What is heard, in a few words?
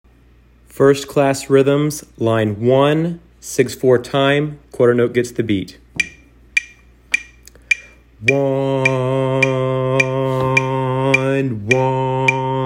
rhythms